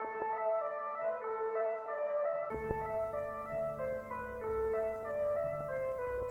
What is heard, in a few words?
keys, Sad